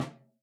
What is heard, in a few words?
SNARE P, STICKS; SoundBytes - Early Patches